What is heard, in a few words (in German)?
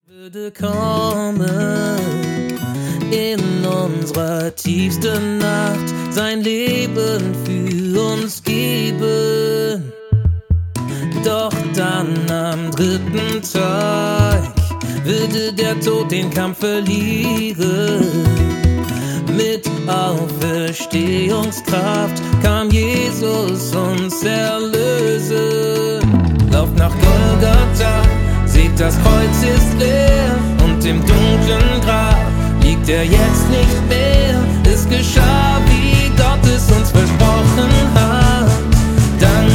Er lebt, Samba